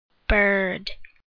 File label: bird